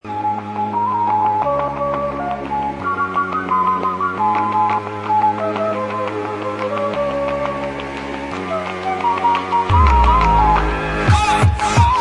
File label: The flute tune